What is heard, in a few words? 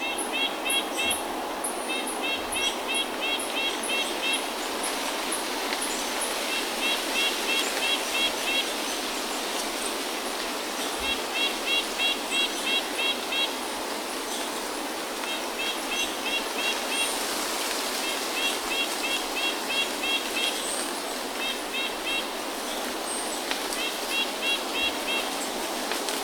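Ambience Birds Loop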